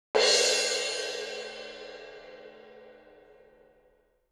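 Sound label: suspended cymbal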